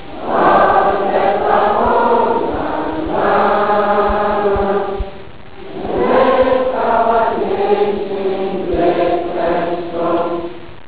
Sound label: national anthem; Navrongo